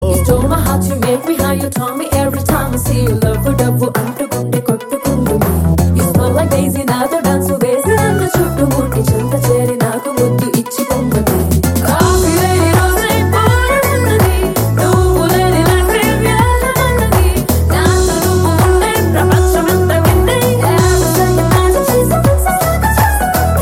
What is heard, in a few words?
Heart Beat English Version